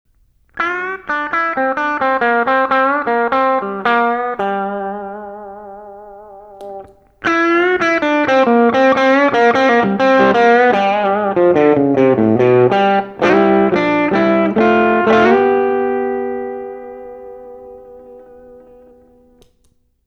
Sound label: boost; ToneCandy